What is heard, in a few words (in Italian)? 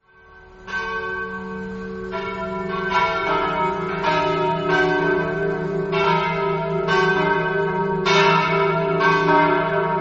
campane; rives